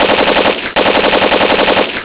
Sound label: machinegun